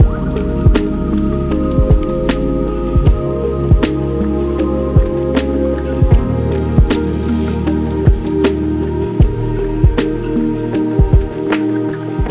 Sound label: Lofi; Dolby